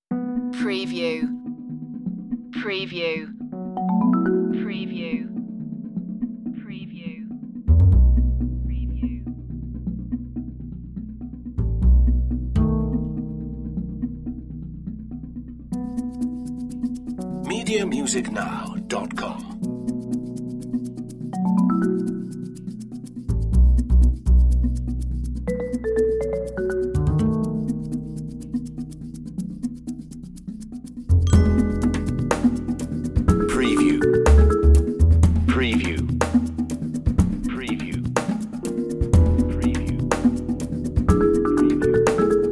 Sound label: life style tv light comedy